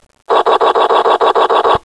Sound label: falcon Cannon